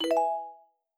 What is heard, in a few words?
dice win